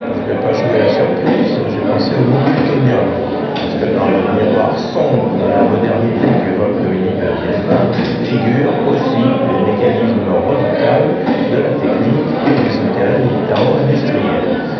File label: noise